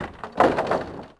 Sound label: Oars Wood; Civ4 warlords